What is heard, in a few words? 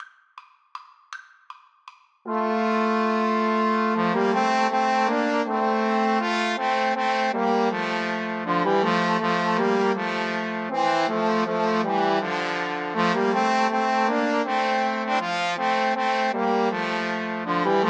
Trombone Trio